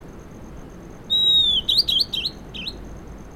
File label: wren babbler long billed Rimator malacoptilus